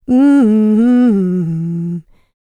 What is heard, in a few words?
LICKS, Vocal Planet, R&B; ILIO; Dance; LT R+B